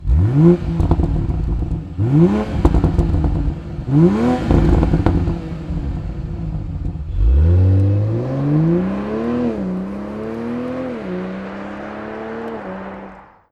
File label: Toyota GR Supra SERIE; remus